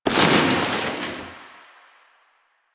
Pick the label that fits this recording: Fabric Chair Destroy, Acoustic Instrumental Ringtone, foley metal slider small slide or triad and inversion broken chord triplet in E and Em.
Fabric Chair Destroy